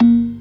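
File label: PERC D sharp 3; Best Service; Advanced Media Trax Modern Composer; B3 FULL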